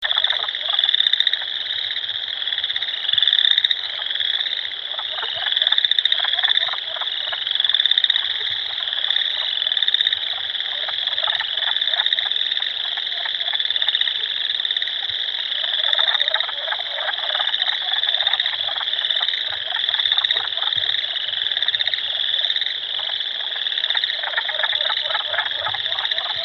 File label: Wood chorus Frogs